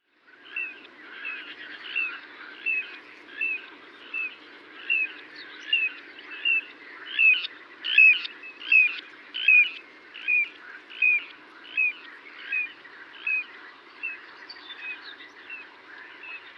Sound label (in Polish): sieweczka rzeczna kleine plevier zang baltsvlucht; peter boesman